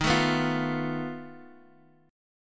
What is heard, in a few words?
F 7th Flat 5th Eb